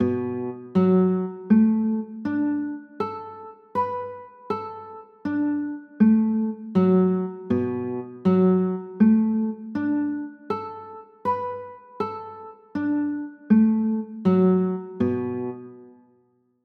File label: b m7, chords; pos 7